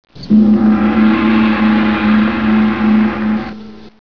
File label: gong